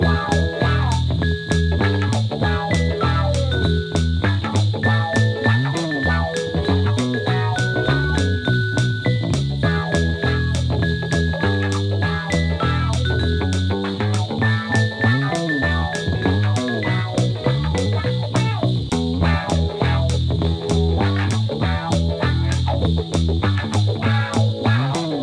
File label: Xmas Jingle Miracle Mid; bully scholarship edition